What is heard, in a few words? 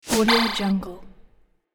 throw game accent